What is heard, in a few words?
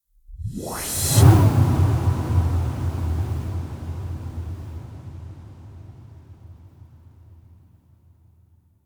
FX Whooshes; FX Bundle 1; Free FX Bundle